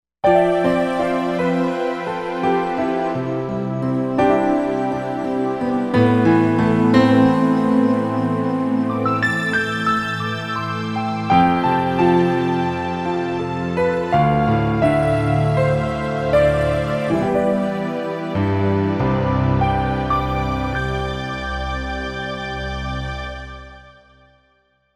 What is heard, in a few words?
MIDI Piano